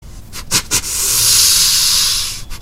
snort wheeze deer call sound

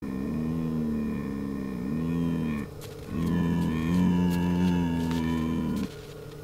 buck roar deer call sound

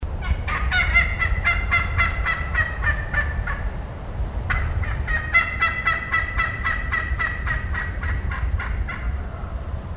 excited hen yelp turkey sound

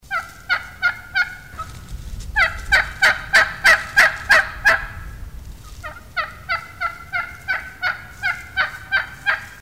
hen yelp turkey call sound

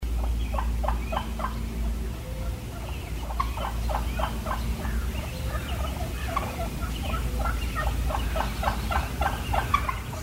tree calling turkey sound

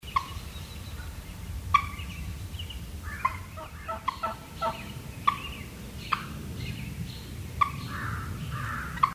putt turkey call

